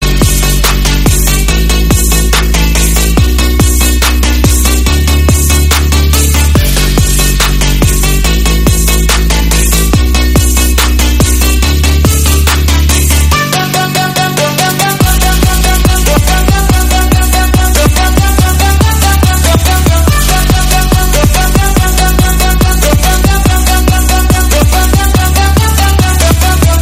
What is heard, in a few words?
Marimba